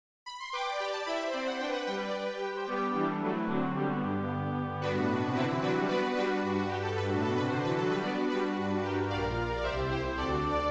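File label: soprano; Poulenc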